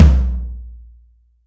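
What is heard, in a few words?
Room Floor, Acoustic Tom, Yamaha DTXpress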